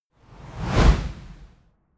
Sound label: whoosh